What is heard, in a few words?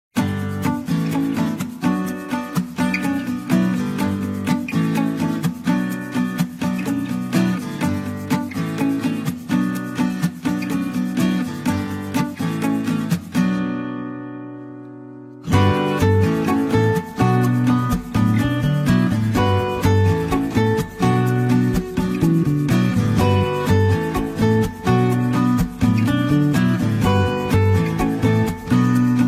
Jazz Relax with Jazz; chill; Jazz Timer